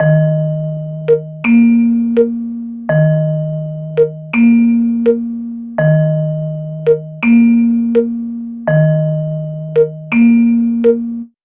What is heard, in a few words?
Mellotron Vibes; Cinematic Funk; Sonic Foundry (Sony Creative Software); Cinematic Funk Urban Tone Poems